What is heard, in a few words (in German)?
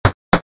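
Tür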